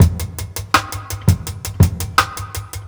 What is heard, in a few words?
Live Half Time, Jungle Breaks; 90s Sample CDs; Original Jungle Breaks